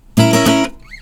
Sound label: ag error, acoustic guitar fx